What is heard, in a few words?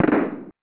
hit